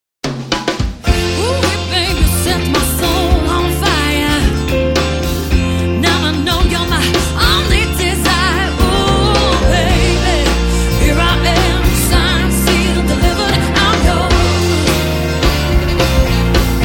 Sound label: soul